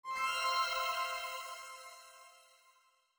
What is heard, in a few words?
healing magic spell; My Cute Commissar